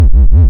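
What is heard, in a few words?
waveform FM triangle sine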